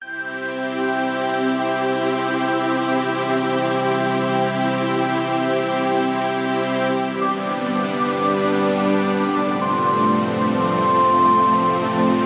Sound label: music beautiful reef; zapsplat